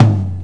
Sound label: Lo Tom, Tama RockStar